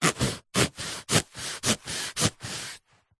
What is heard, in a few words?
foley foam block dense cut slice saw serrated knife